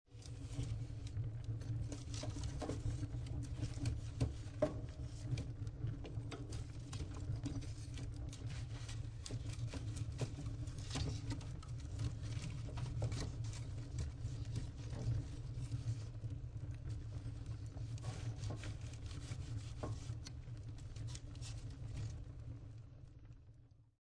Fire Poking And Moving Logs, Fire, Poking, Metal Fire Poker Poking And Pushing Burning Logs In Fireplace